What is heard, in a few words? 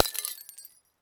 Light Bulb Shatter; SFX; IRL Recorded